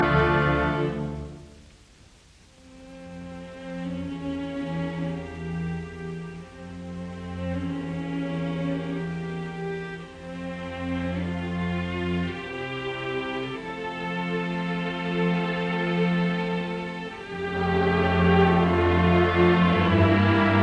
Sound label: Andante; Lalo; Symphonie espagnole; Op 21